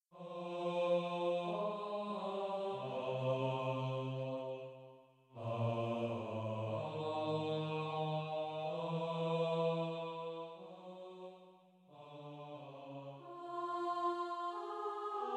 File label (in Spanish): coro atonal, double canon atonal